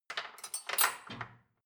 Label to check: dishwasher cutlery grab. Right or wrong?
Right